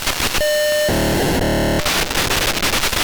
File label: pocsag stereo